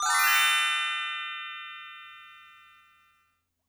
kira n

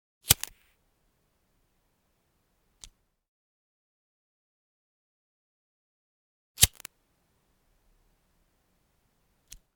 Lighter Dispos

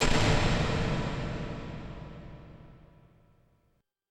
shock

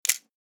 Camera Shutter

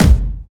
kick

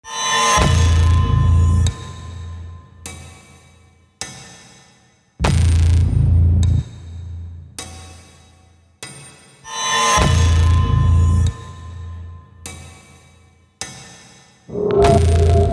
Death Of All Light RHYTHMIC

